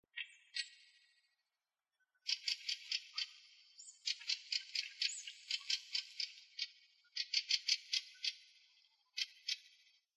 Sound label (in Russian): drozd ryabinnik